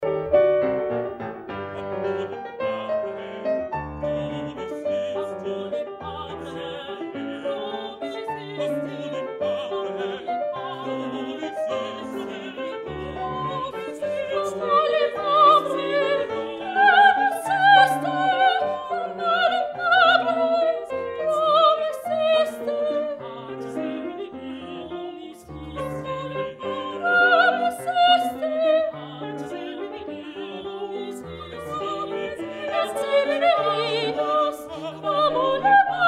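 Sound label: soprano; quam olim; schola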